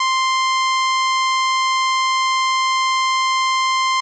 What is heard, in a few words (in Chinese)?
Moog Modular V C5的锯齿波